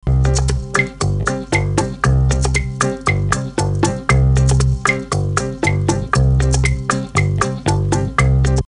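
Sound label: latin, mellotron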